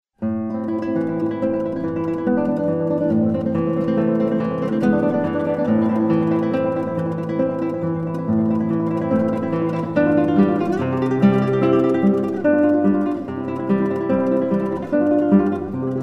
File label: guitar duo; Recuerdos de la Alhambra